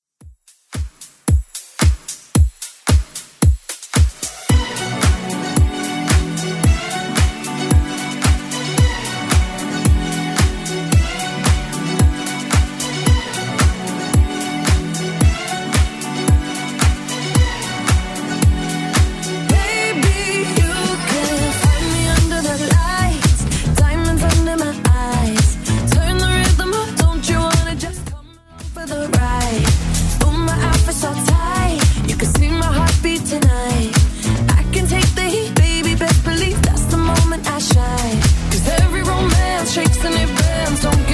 Top40 Extend Redrum 112 bpm

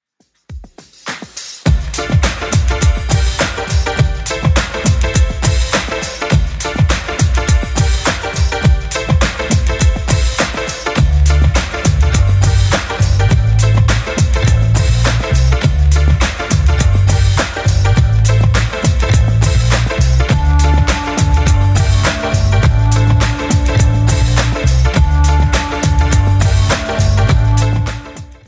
90s, 103bpm

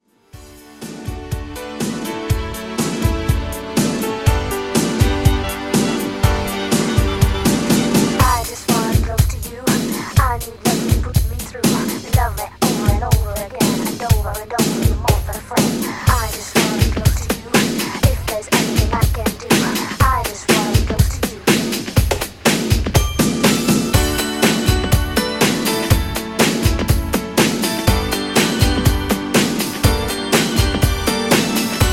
90s Redrum 122Bpm

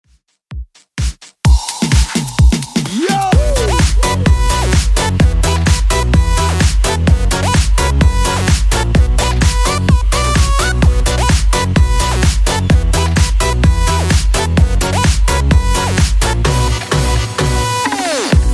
2ks Redrum 128bpm

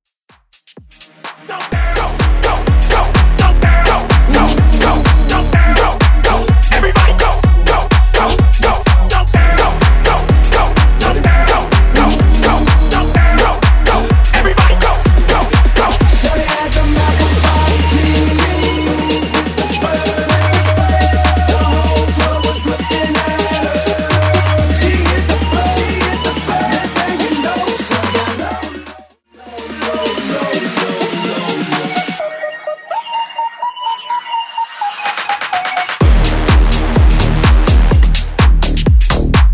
Tech House

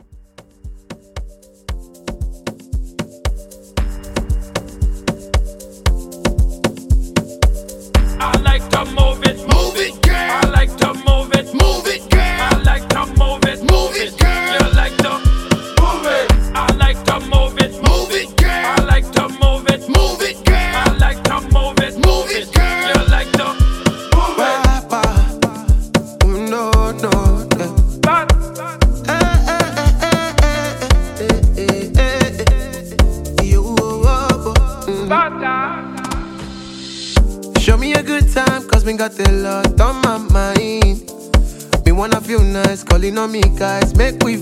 Afrobeat, 115

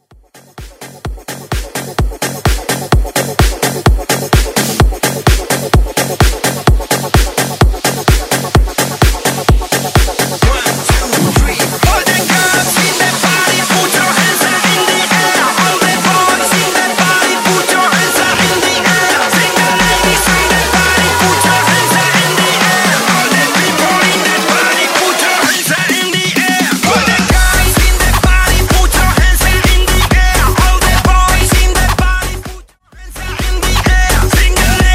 Bounce Mashup

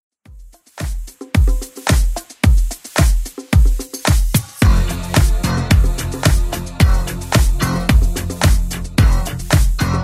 80s Club Edit 110bpm

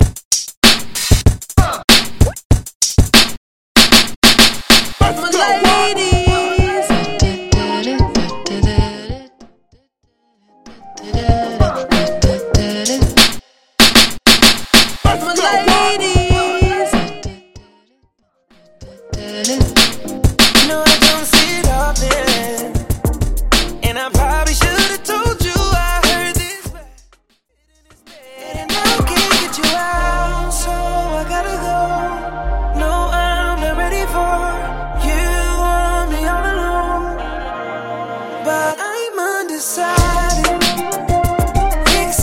Top40 RB Mixshow Hype Into Re Drum 95 Bpm

